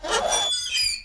metal cl; Mafie 1

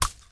grass; Mafie 1

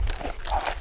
pull; Mafie 1

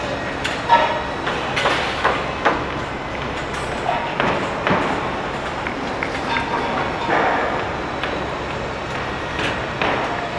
build; Mafie 1

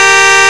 horn; Mafie 1

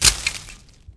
bush; Mafie 1

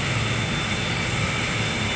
jet; Mafie 1

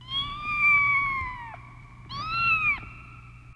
cat; Mafie 1

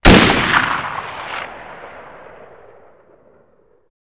mosin; Mafie 1

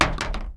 metal; Mafie 1

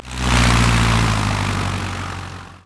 out air; Mafie 1

